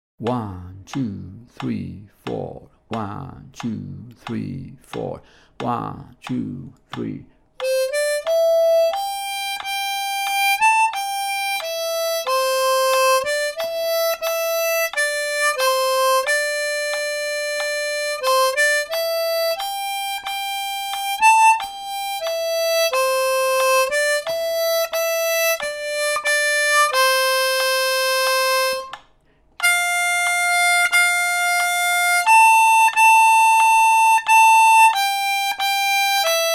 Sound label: 90 bpm, harmonica; Oh Susanna